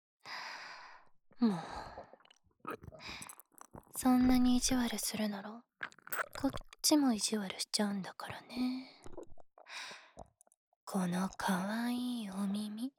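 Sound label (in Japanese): 耳舐めされながらお姉ちゃんに手コキ・ディープキス・フェラ・騎乗位・お掃除フェラされる音声; ズボラお姉ちゃん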